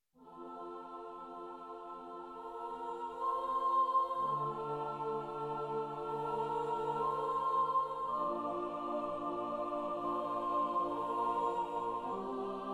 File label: Choir